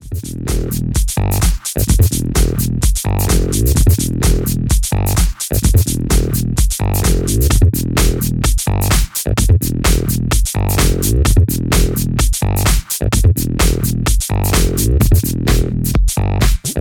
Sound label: tech house